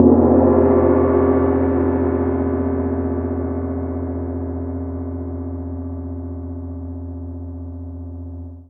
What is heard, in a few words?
Wind Gong one shot; Metal; Sonic Foundry (Sony Creative Software) - Ambient Atmospheres & Rhythms; Ambient-Metals